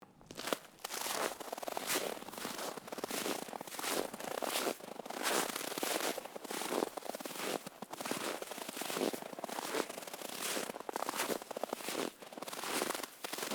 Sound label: footsteps snow; foley